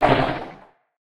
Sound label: cast